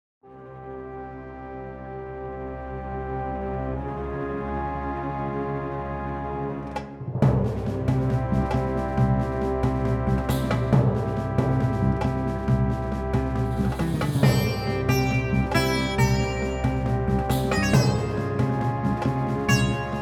pyramid, bgm

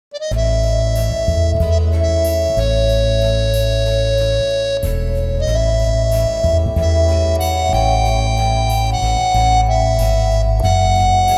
go home, bgm

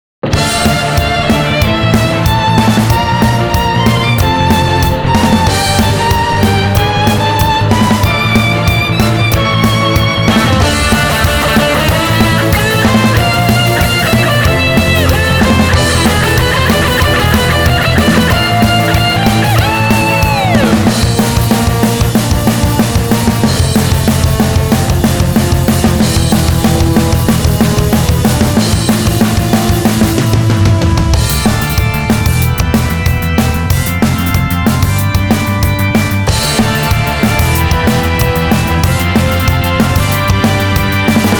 The last hot battle

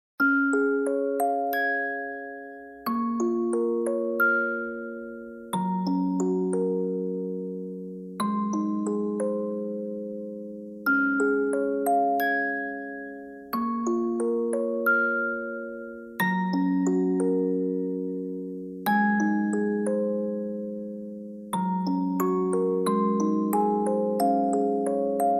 Nostalgic memories, musicbox

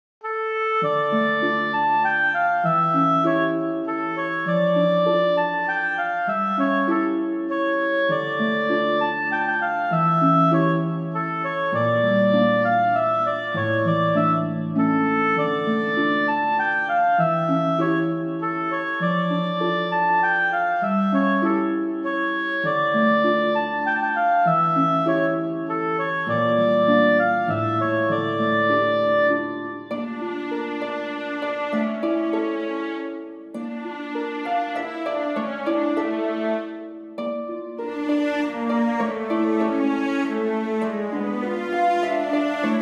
wandering, bgm

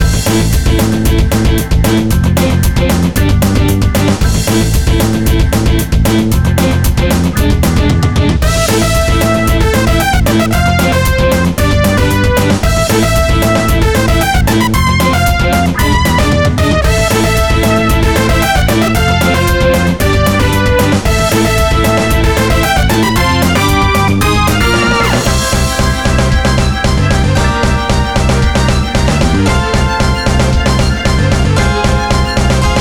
free run battle, bgm

